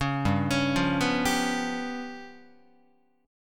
G 7th Flat 5th G down Guitar; arpeggiated; JGuitar

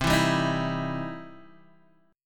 E Minor Major 7th Sharp 5th C

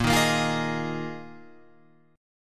Bb 7th Suspended 4th Bb Guitar Open F; strummed; JGuitar

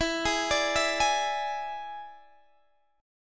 E 7th E down, E7 Chord; arpeggiated

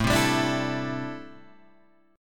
A Minor 11th A Guitar Open G

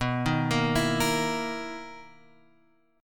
B Major 11th B, guitar; BM11 Chord {7 6 8 9 X 6} arpeggiated

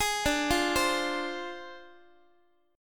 D Minor 7th Flat 5th Guitar; Dm7b5; arpeggiated

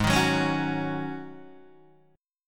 G sharp 7th Suspended 4th G sharp, Guitar DADGAD

